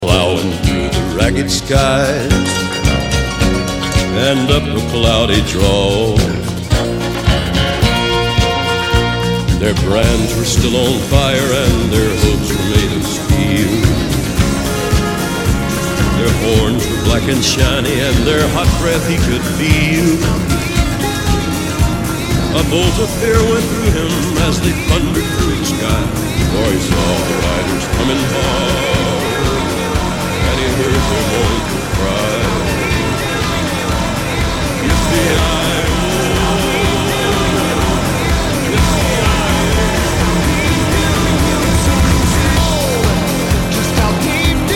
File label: mashup frantic